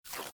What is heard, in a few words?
Ticket Rip; Angry Birds